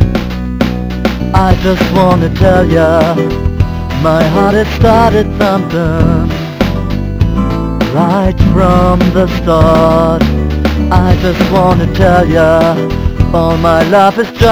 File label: Guitar tape ugly